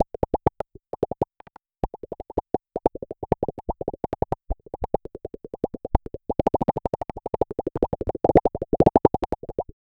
wavy popping sounds

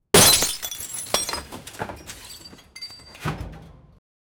something hit window glas

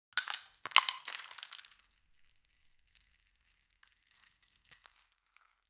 rock candy crush sound

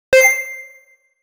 hit sound for retro game

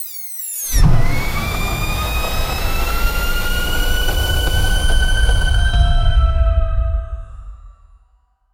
magical solar attack

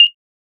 futuristic cyberpunk soft, blip

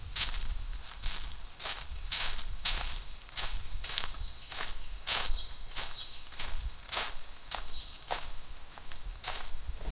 the sound of footsteps po, forest

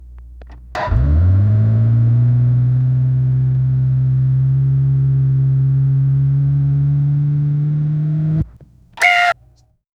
aggressive acceleration, performance

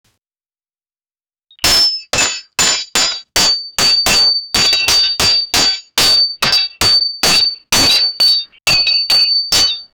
dramatic sound, fighting

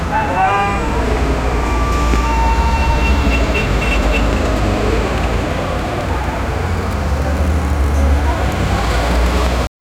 busy daytime city ambience, chatting

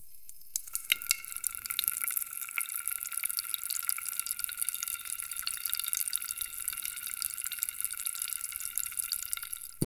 sound of three times pour, powder

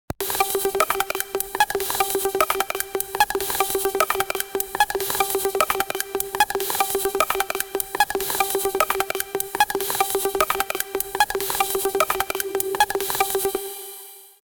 forest psytrance percussi, 150 bpm

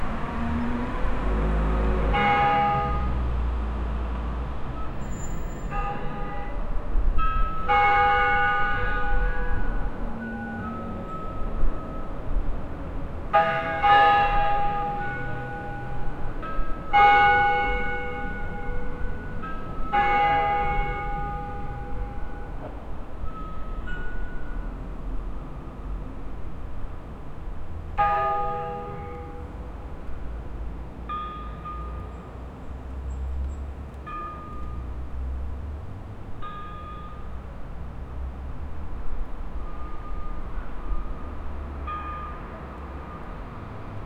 distant rickshaw bell fai, city

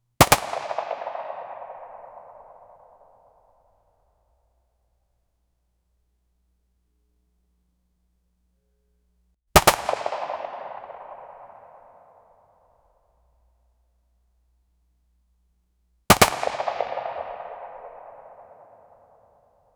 clear single shots, assault rifle

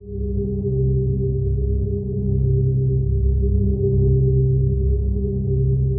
morning ambience

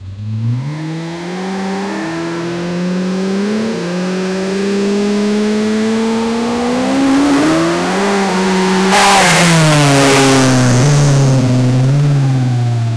sport car aston martin

